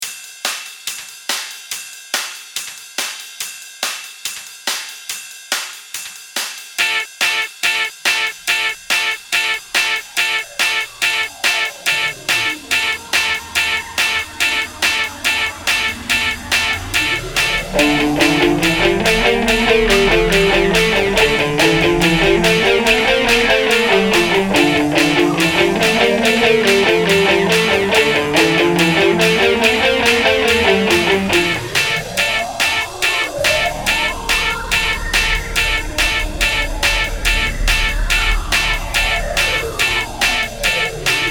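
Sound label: guitar land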